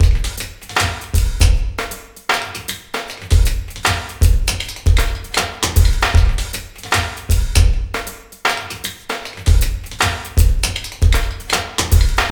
TAP DANZE 78

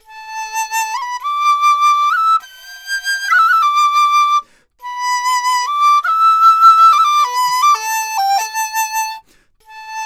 DIZU, 100; INIS - Opium; DIZU 100-G

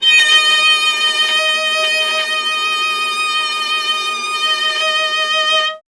viola D#; Miroslav Vitous; Solo Instruments VOL-1

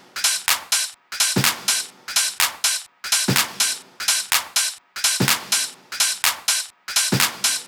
125BPM TOP LOOP

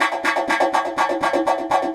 Echo Chuff, Synthetic Pulses

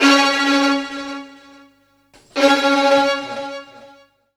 AR STRGS 1, Roots Funk; USB Soundscan vol.41; AKAI

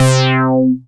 BAS MKS-50; Best Service Gigapack 2

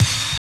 BD, Drum & Bass, 160 BPM; Ueberschall; Drum & Bass Flash Trackz

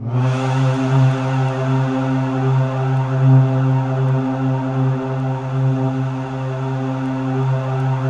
C3, Synth Leads

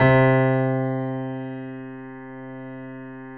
C 2, Keyboards Acoustic, GRANDP ST; USB Soundscan vol.17

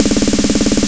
Snare Roll; Sonic Foundry (Sony Creative Software); Afterhours EDM; Session 10 - Come In Hard

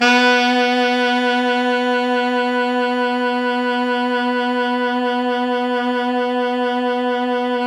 Pop Brass, B2 -R; Best Service ProSamples vol.19; AKAI

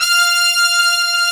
S BRASS F5

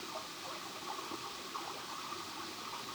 Waterway A Light

